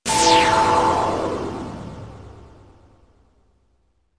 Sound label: cut in; Cut-in special move #2 (sound effects); Puzzle